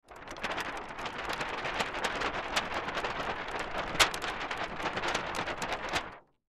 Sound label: Plastic Sheet Shake, Plastic Sheet Shake Large; Materials; Foley; Sound Effects:Materials; SoundEffects-Blastwave